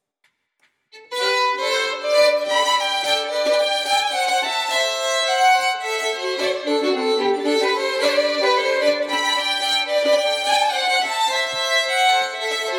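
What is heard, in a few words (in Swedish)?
Schottis, säckpipa; efter Sigvard i Monkerud; Mellan himmel och jord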